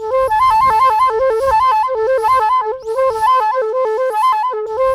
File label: FLUTE FX; Greytsounds - Philip Wolfe David Crozier's Saxophones